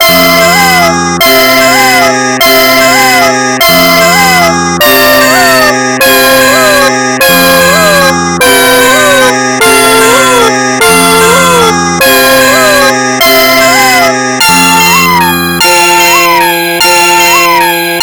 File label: piano; Jeux Interdits